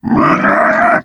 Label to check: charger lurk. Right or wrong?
Right